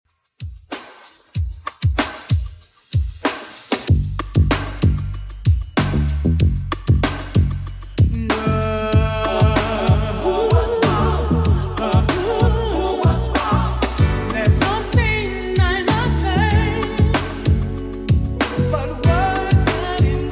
80s Redrum 95bpm

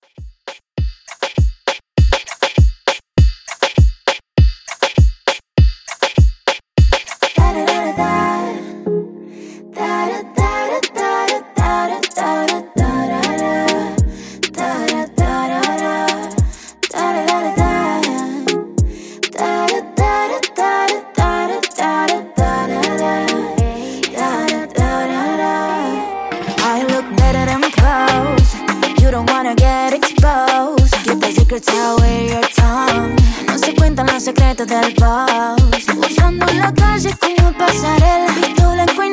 Latin Dancehall 100Bpm

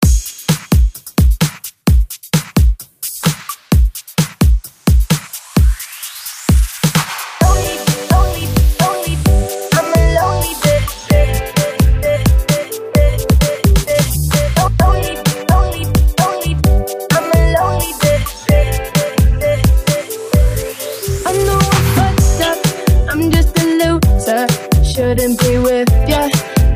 breakbeat 130 bpm

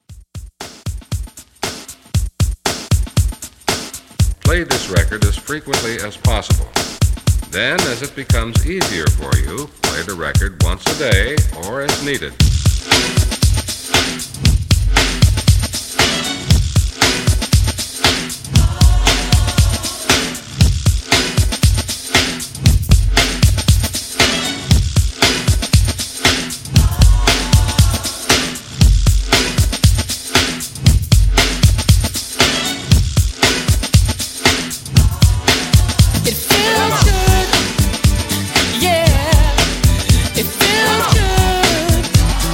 90s RNB Re Drum 117

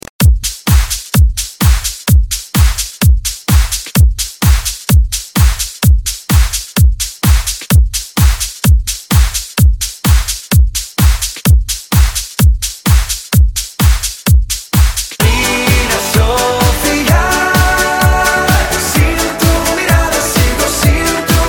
Dj Intro Latino Hook; Redrum

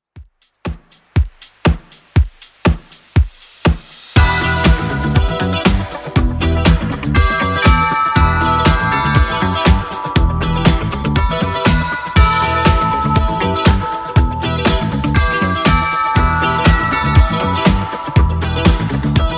80s Redrum 120bpm

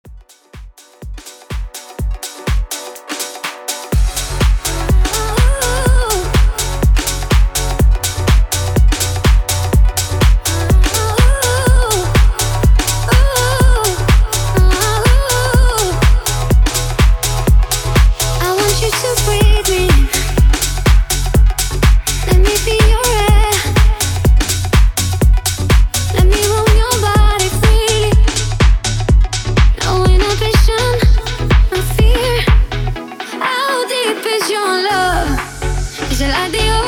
HOUSE MIX 124 BPM

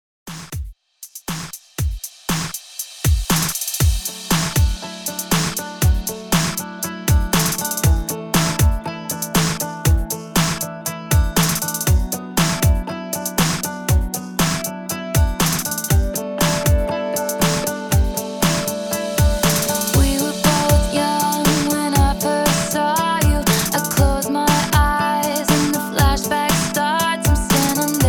REDRUM 119 BPM

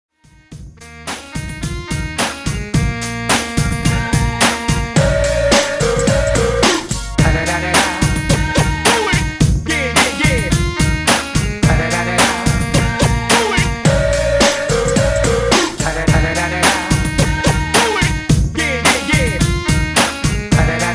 Break Intros 108Bpm

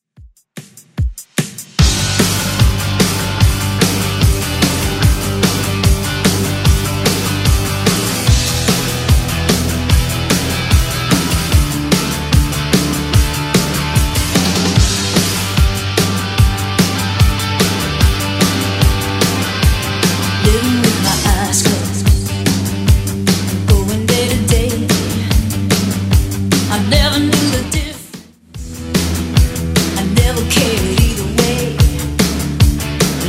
80s rock redrum 148 bpm

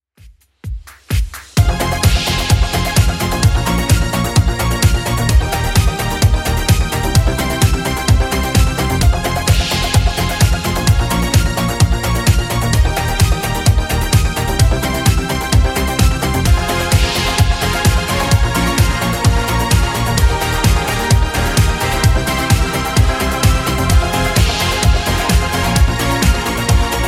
80s Redrum 129bpm

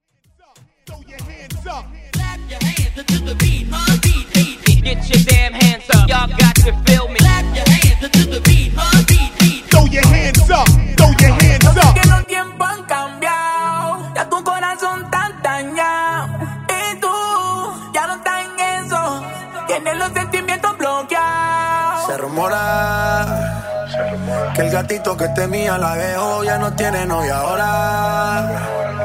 Moombah Hype

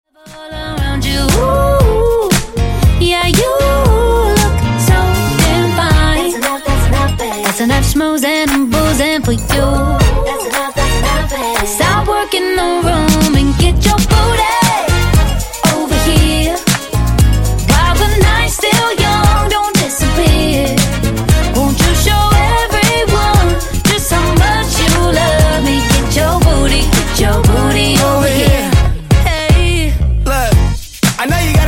Dj Intro Outro Dirty 117Bpm

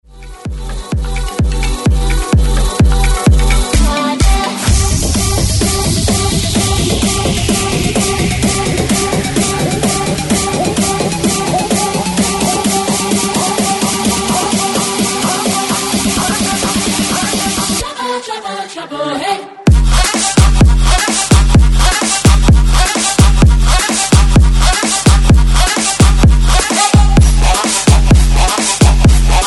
130 BREAKMIX; DJDOLLS

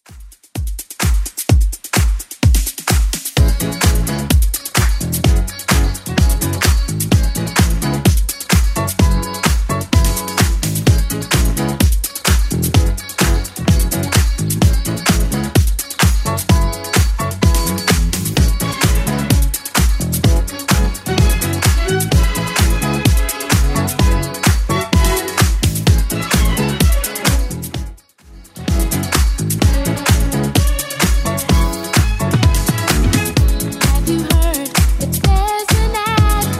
80s Club 128 bpm CLEAN

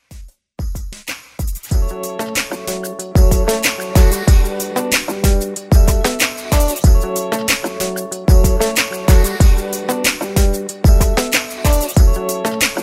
Top40 Redrum

